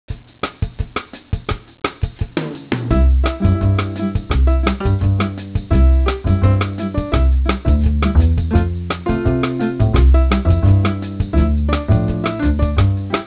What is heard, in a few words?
jingle bells bossa; talkswitch